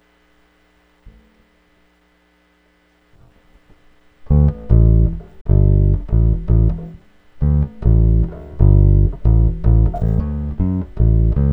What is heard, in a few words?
bass